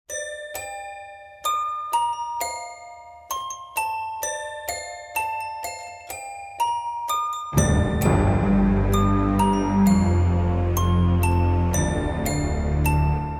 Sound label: Symphony For Toy Piano In G Minor